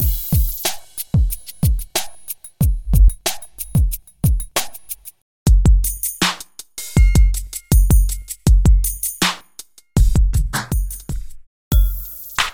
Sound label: rnb street beats; electronic drum loops